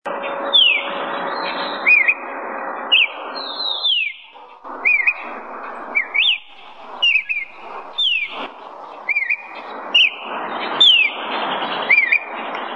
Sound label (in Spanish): voz cardenal común